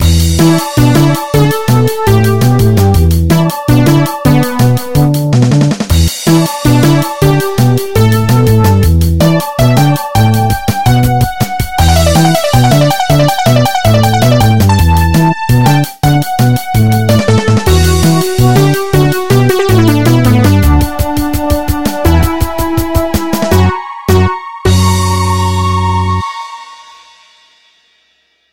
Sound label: Opponent Victory